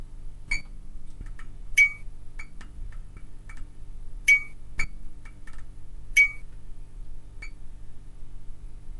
Flickering Fluorescent Light With Background Hum Seamless Loop